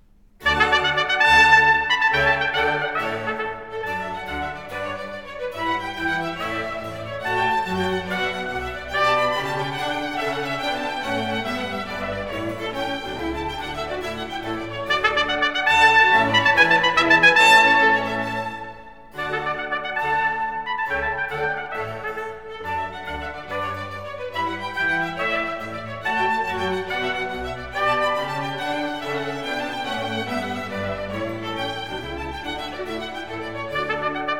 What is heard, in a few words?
suite for trumpet, strings & continuo in D major; spurious arrangement; Water Piece; Trumpet Concertos